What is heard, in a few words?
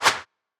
woosh